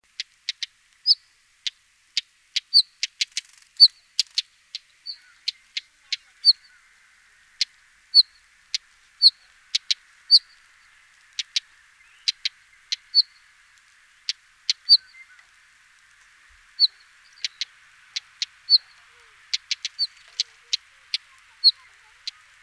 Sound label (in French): traquet motteux